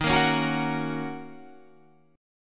D sharp Minor 7th D sharp, down, Guitar, Half Step Down